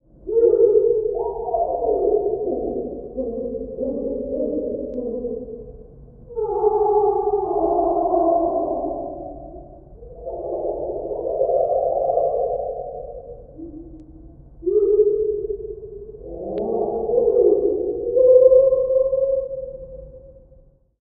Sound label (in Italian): cardellino; 6-cardellino; I 7 CANTI